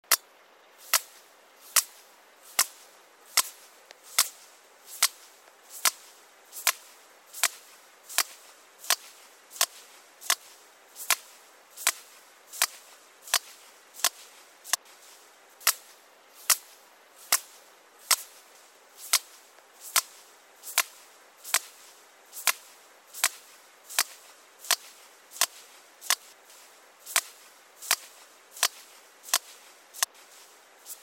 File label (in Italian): Vocalizzazione Capodoglio